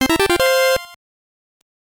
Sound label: Jingle; Gyouten Ningen Bachelor Doctor Guy no Yabou; GBC